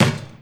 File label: Street Ball Wood Back; SFX Kit; Street Basketball